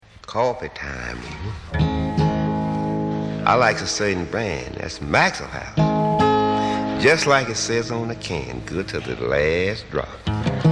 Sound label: coffee blues